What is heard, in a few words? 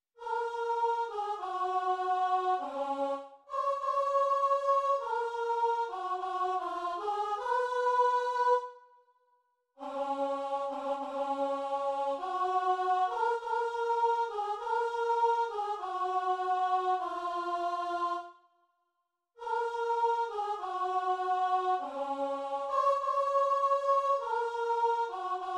chorus, alto